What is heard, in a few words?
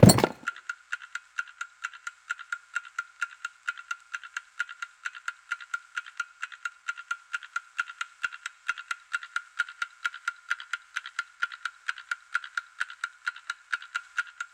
Clock Tick